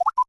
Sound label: Mail Error